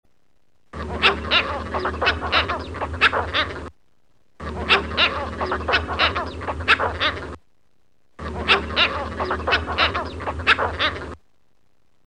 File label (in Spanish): garcilla cangrejera